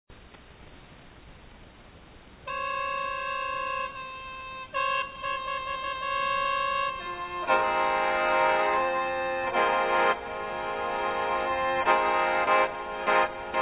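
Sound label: Instrumental Music, Lao Khen Solo Khen; Lotfay Taylang; Vientiane/2000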